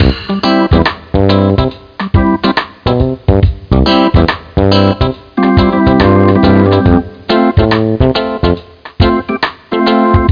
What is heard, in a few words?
RAGGAE